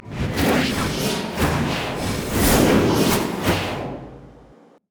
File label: human idle recall; Elise